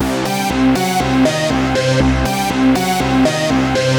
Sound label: Bleep Bloop loop; Slinki